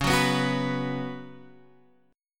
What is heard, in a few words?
Gb Suspended 4th Db